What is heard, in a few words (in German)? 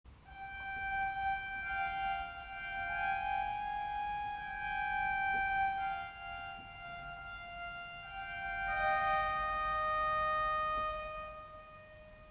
Cornopeanreihe Clairon Harmonique 4, orgel